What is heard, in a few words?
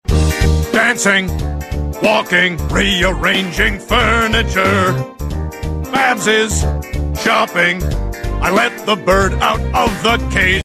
dancing walking rearranging furniture; Walking Dancing meme sound